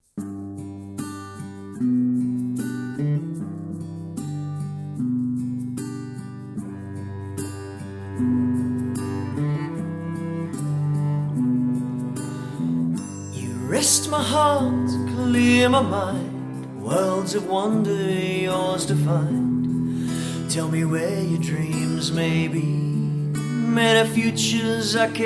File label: Lullabye Bootleg